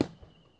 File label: PERC COMBI; Crypton; DrumBase5000